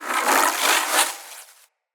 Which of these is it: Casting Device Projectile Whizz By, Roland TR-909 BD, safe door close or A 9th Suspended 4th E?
Casting Device Projectile Whizz By